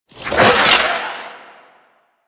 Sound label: Necramech Repair Drone Right; Enemies; Warframe